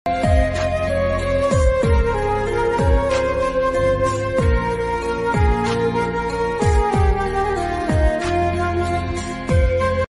sad ringtone music mood off ringtone instrumental ringtone